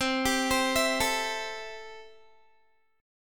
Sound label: C 6th, Guitar; arpeggiated; 20,22,22,21,21,x; 4E,4A,5D,5G,5C,6F